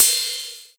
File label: Hi Hat Open